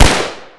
pistol fire; weapons; Half Life 2

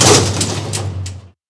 elev, ambient tones

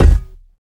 physics cube sm; Portal 2

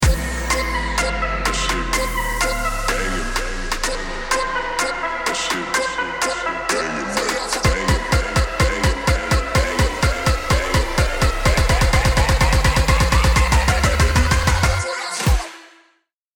bomb ten sec count

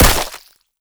break flesh small; physics; breaking

